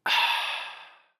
Ahh, Relief, Drink, Soda, Exhale, Male; 344 Audio; The Burger Kitchen

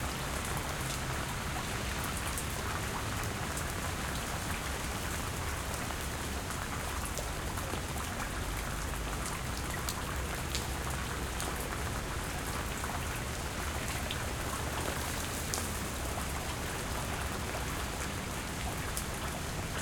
QUIET STREET Rain near sewer; Articulated Sounds; Quiet Streets

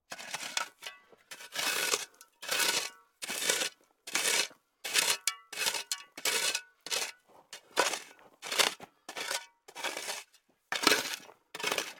Shovel Scraping Dirt; Tools

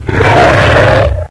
bullsquid attack; hl2

